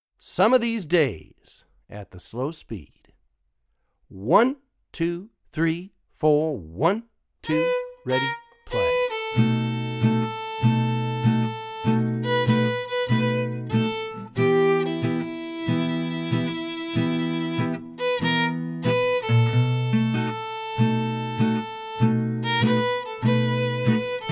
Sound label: Melody Slow, swing jazz; Swing Jazz Violin with Hot-Club Rhythm